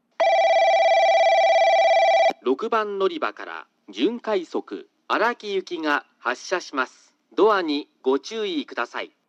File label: kokura semirapid araki, portable recording